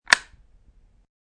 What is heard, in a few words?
Light Switch, Single sound of a light switch flipped on or off; Household